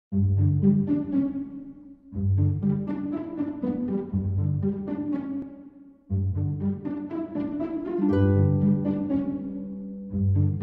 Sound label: Nighttime Theme; Adopt Me; Android